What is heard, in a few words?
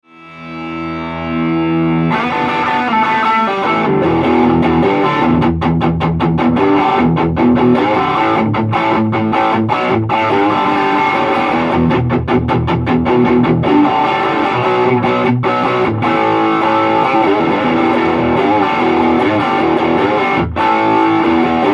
Amp DRIVE Fullup